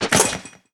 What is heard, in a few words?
trap